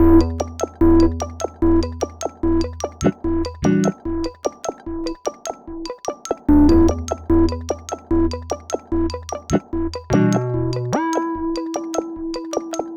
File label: Ambient Rhythm Break; Cinematic Funk Urban Tone Poems; Sonic Foundry (Sony Creative Software)